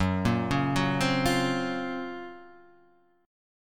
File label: F sharp Major Sharp 11th F sharp; arpeggiated; JGuitar